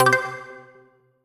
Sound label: Bonus Sound; Fruit Chutes